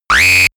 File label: 80s alarm